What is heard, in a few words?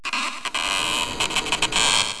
nail pull